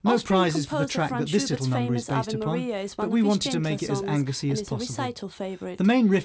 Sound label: guit mix